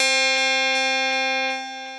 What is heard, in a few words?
Blood Pick, Guitar & Plucked; Drive and Glow; Ableton; Factory Packs